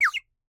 Birdwhistle, clay, painted, water, airy, wispy, clear, solo chirp; Eiravaein Works; Vaeyan IV